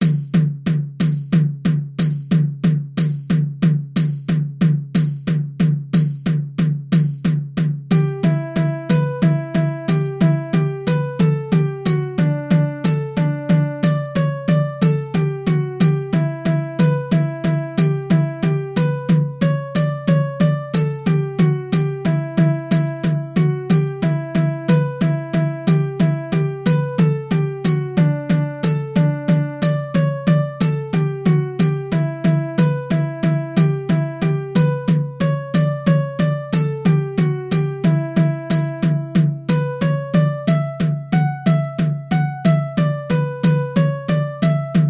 Jig clicktrack; Swallow Tail Jig

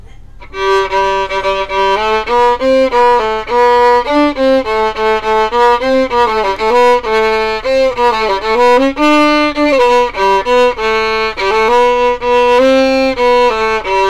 Gloucester Wassail

